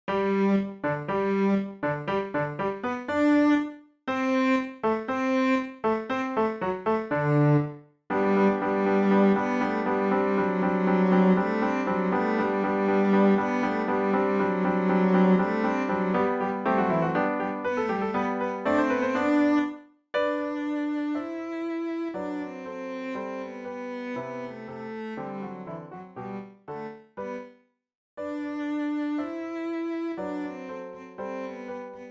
eine kleine nachtmusik cello

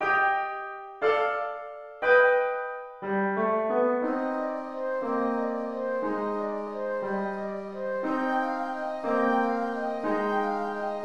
flute

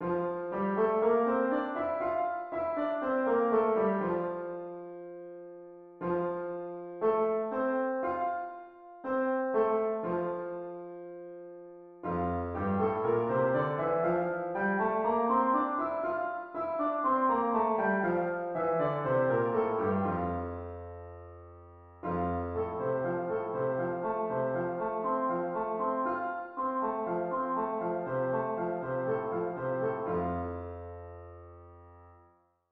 f major piano

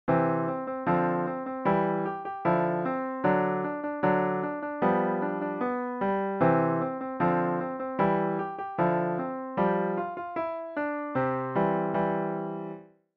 piano solo

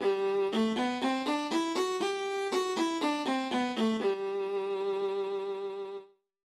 major scales viola

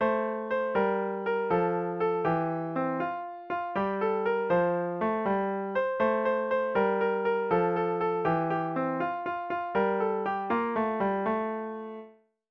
piano

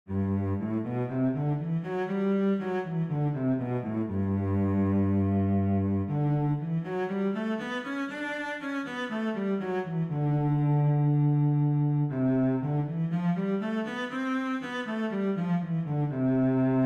major scales cello

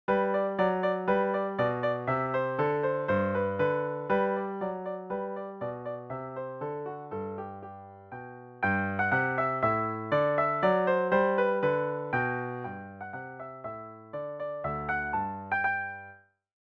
russian folksong piano

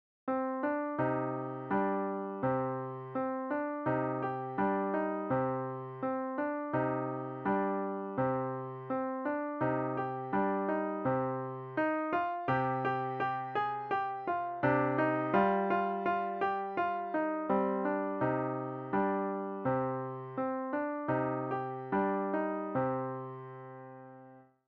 piano

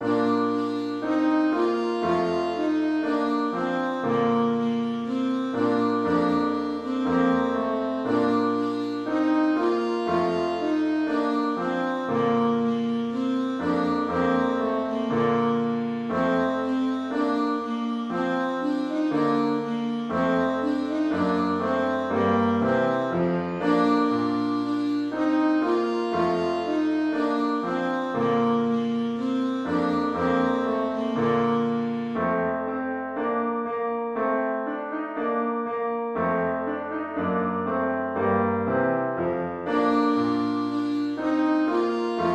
alto sax

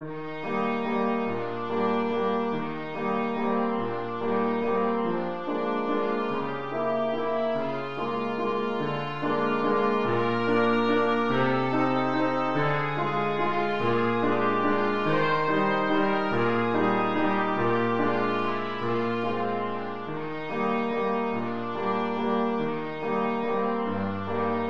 sleeping beauty waltz trumpet

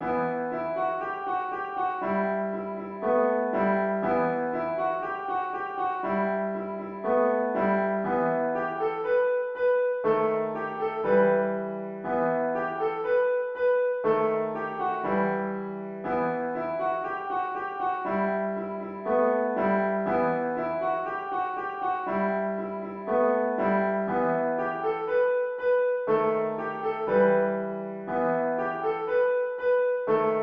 zum gali gali piano

